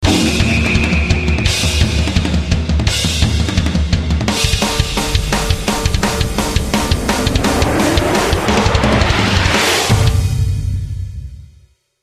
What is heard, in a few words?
race End 10 Second Countdown